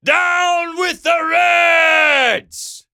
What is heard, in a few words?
charge